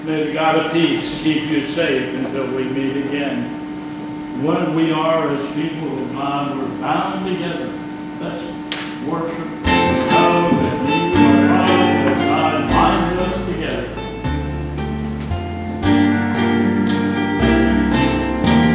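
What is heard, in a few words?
Benediction and Chorus, church service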